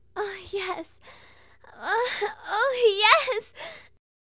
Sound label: Sex Lines Orgasm; Hilzartov; Fairy Size Doesn't Matter